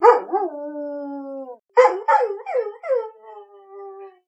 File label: dog